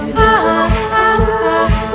aah aaha, phone tunes

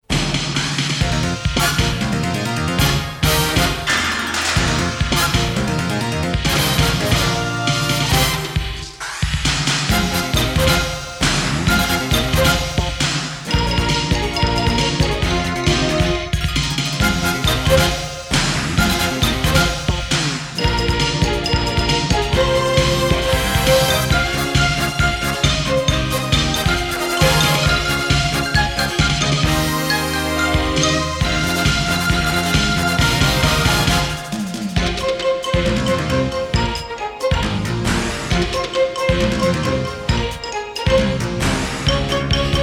Opening Instrumental Version; Dragon Ball Z GT; DB Opening

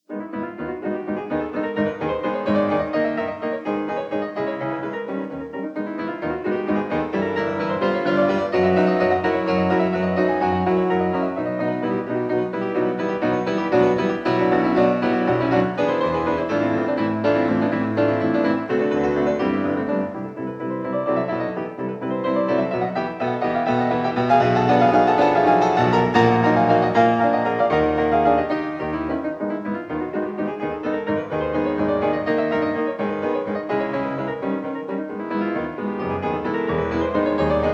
Prelude in G sharp minor; F. Chopin; 24 Preludes, Op 28

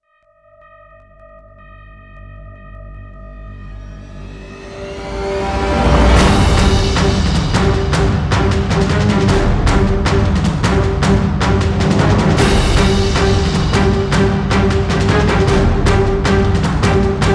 No Choir

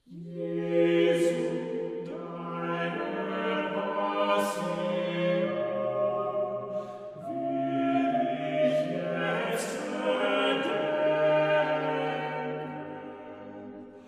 Chorale for 4 voices; Cantata No. 5 'Wo soll ich fliehen hin,' BWV 5; 'Jesu Deine Passion'

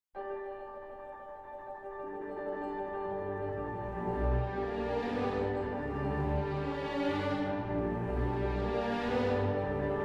zoological fantasy for 2 pianos; Le carnaval des animaux (Carnival of the Animals), zoological fantasy for 2 pianos & ensemble; Saint-Saëns; André Previn

